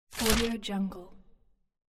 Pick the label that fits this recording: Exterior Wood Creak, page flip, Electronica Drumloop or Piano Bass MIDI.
page flip